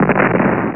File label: Explosion; ST-XX Amiga Soundtracker Sample Packs